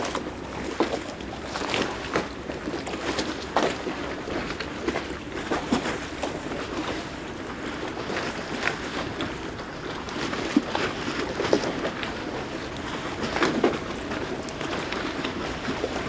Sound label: ambience canals water boats; Counter Strike Model Pack; CS:GO